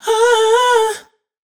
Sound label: riff Huh Em wet, vocal; Slate Digital Omnivox Vocal Sample Pack